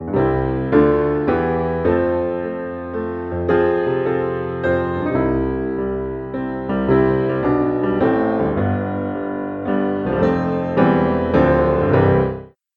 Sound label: gospel piano background music tone, christian gospel